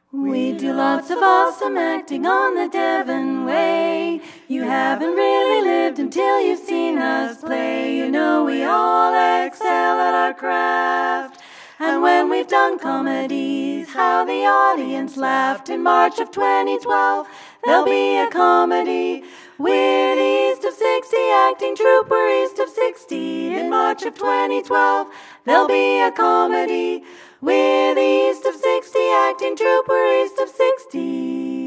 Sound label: Jingle; East of 60